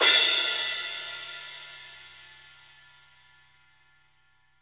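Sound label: crash, GM drum set; AKAI S-Series CD-ROM Sound Library VOL-8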